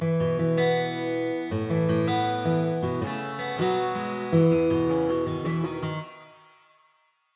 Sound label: Town Intro Guitar base; Backpack Hero